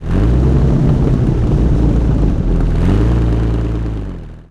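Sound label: comet engine interior load, tanks; engines; War Thunder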